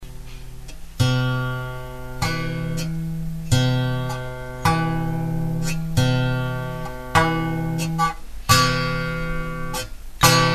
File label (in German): gr terz, musik